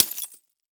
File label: Impact Ax Hit Glass Debris Fine